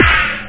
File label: electro-snare; snare samples